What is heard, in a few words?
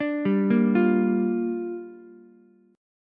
G Minor 7th, Ukulele; Gm7 arpeggiated; JGuitar